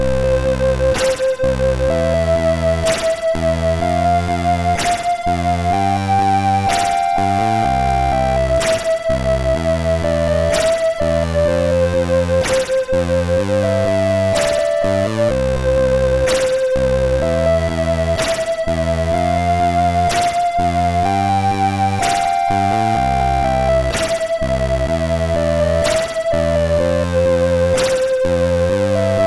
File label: sid, MOS6581R3; Arcade Pilot